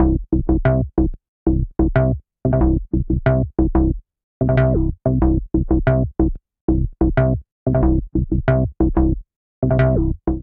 Bass C Major 92 bpm; Bassline; Tonal; MIDI Clips; Public Announcement; Drive and Glow; Ableton; Factory Packs

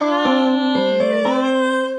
Sliced Melodies Root G, vocal; Sliced Vocal Phrases; Ableton; Factory Packs; Voice Box; Harmonic Vocal Racks

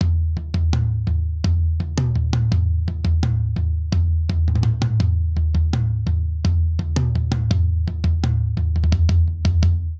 Straight Seven Rock Toms Only 7/8 84 bpm; Ableton; Factory Packs; Drum Booth

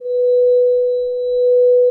Poly Synth; Play Keys; Ableton; Factory Packs; Inspired by Nature by Dillon Bastan; Emit Presets

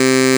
svox, Digital Synths; Ableton Foundation FreePacks

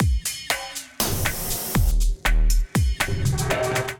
Hard Kit, drums; Sol Hard Kit; Ableton; Factory Packs; Skitter and Step

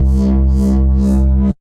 Subdued, Analog Bass; Ableton; Factory Packs; Retro Synths; Analog Bass Vol 1

